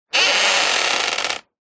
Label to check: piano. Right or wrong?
Wrong